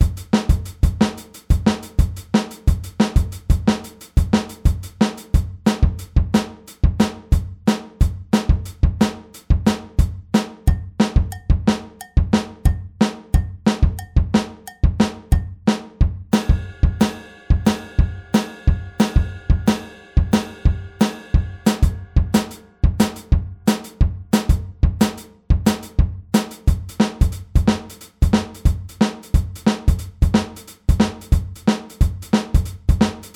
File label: Groove; Groove Nr. 37